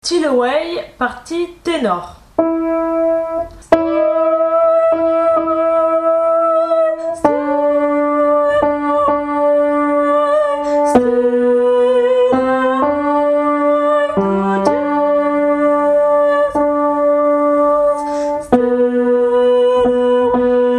Tenor; Steal Away

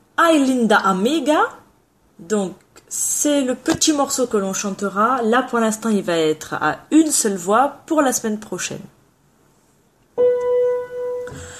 Soprano; Ay Linda Amiga

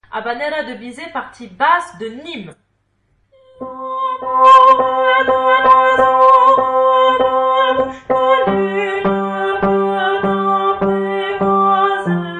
Habanera Basse; Bizet; nimes

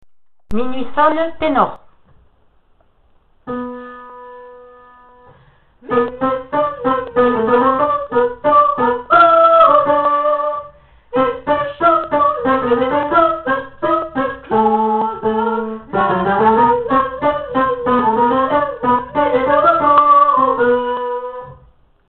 Tenor; Minuit Sonne

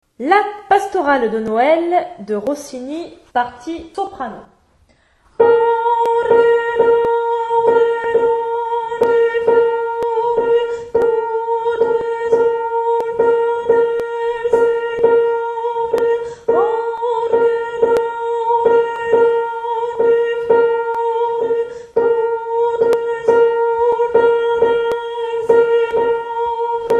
La Pastorale Soprano; Rossini

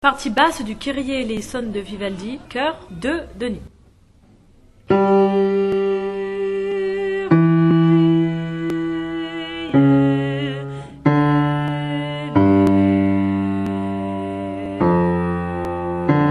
Kyrie Basse; Vivaldi 1mvt Nimes

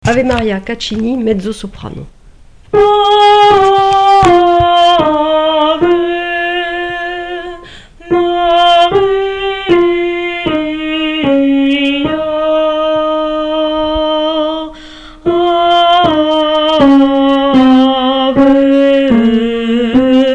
Soprano; Ave Maria; Caccini

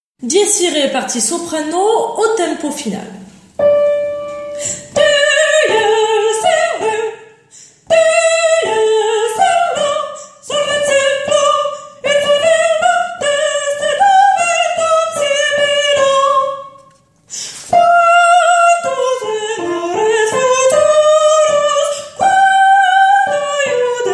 Requiem Dies Irae Soprano; Requiem 31; Mozart

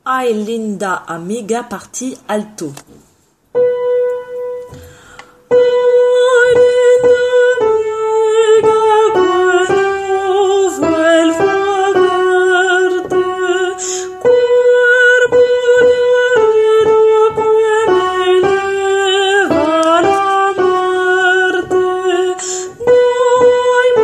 Alto; Ay Linda Amiga

